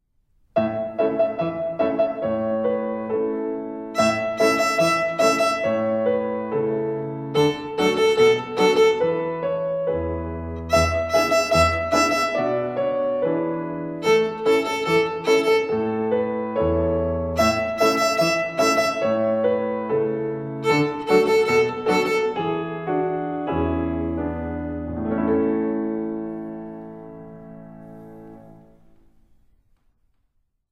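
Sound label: Rhythm on E and A Third Twinkle Rhythm, violin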